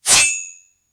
sci fi cyborg slash blade

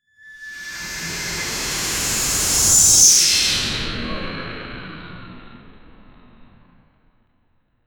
snowball crashes sound

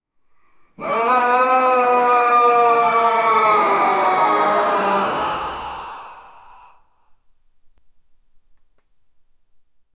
king kong dying, ape

